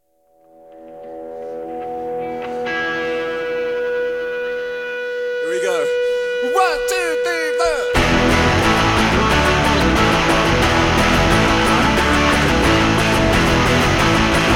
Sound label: guitar